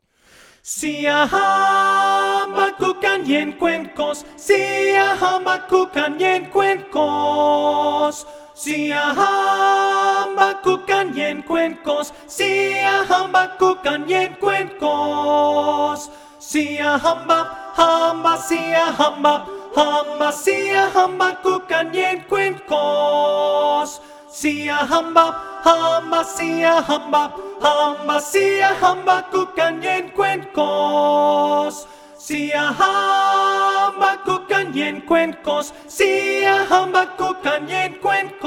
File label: siyahamba (alto)